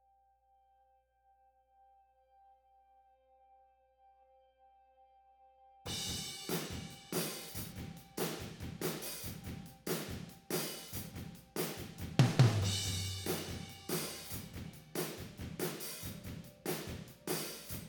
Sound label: toms, ovrhds, room